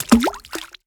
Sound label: water splash small; Bluezone Corporation